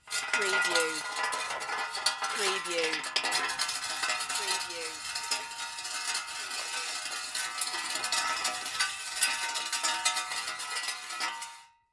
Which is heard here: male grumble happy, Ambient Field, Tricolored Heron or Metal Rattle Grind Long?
Metal Rattle Grind Long